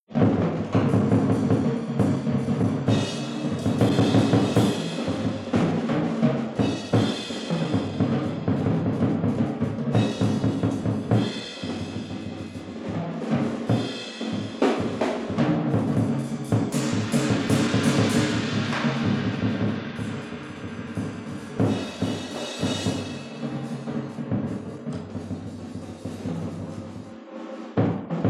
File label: Group Improvisation